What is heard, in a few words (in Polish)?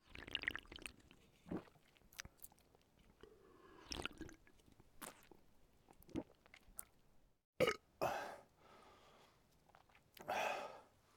wypijanie z butelki